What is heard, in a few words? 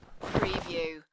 Snow and Ice, Snow movement